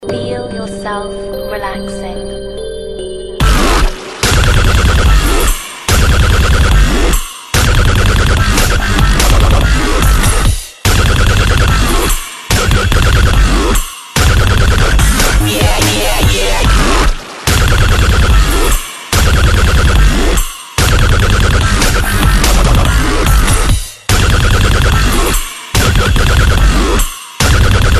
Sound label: Calm Relaxatio Dub